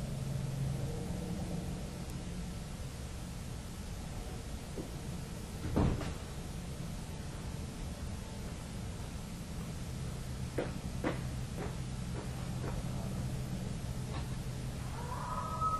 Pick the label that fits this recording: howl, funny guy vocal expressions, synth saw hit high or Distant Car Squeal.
howl